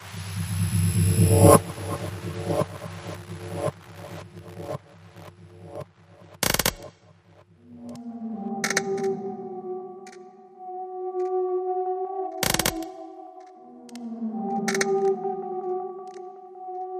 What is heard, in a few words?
Crackling Strings Underscore